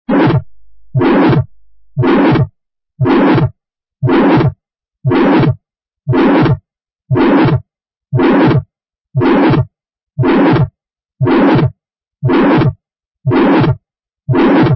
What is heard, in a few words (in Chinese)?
肺动脉瓣区收缩期杂音; XinFeiTingZhen